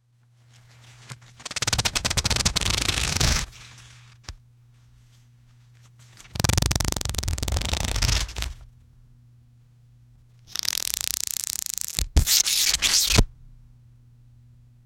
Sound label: laminated photo album, flipping